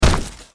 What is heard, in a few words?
foot; gwyn